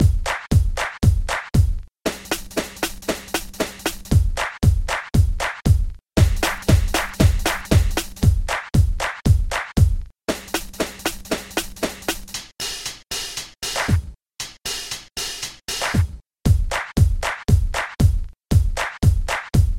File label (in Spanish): Ritmo Hydrogen